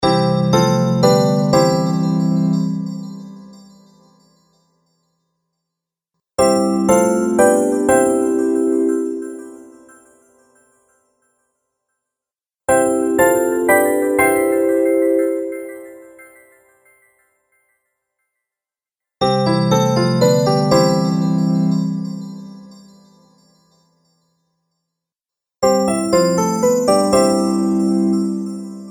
Game Award Logo Collection, Video Game Sound Effects